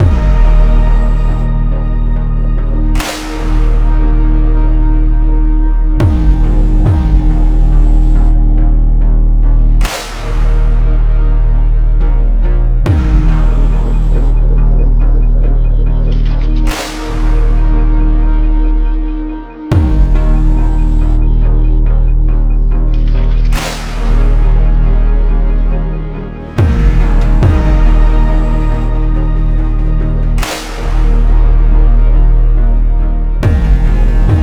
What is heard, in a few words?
GAME OVER LOOP; high hell